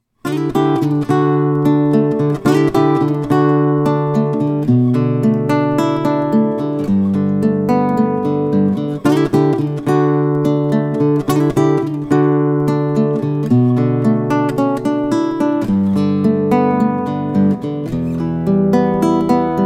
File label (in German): Pop Ballade Mikrofon und Piezo